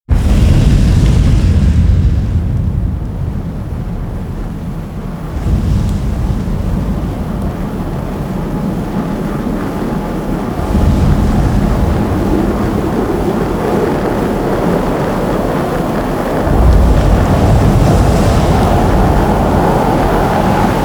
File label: riser